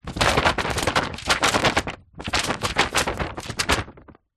household telephone directory yellow pages page movements many